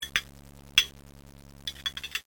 cup saucer

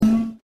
camera out

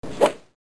golf shot

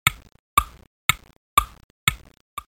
TICK TOCK

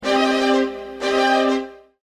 String Trills Cm 120

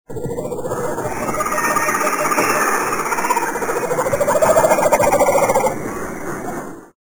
Jungle

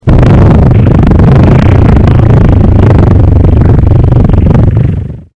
backfire loop

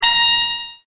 Trumpet

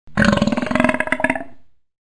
burp

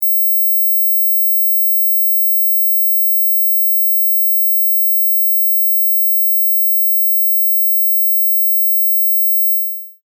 mosquito ringtone

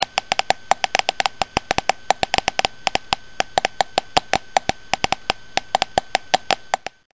clicks

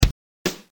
DRUMS

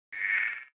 button

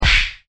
whip

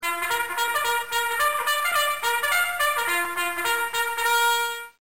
bugle

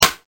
Hammer

